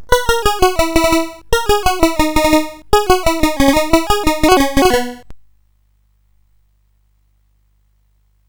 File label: sid CSG8580R5; Congo Bongo version 2